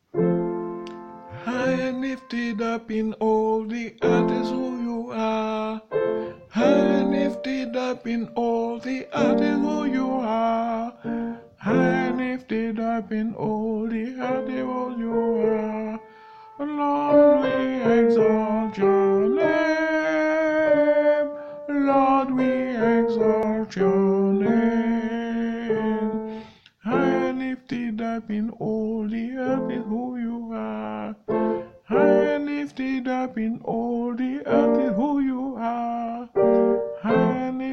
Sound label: Tenor